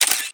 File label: spypenguin take picture